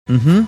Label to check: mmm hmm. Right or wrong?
Right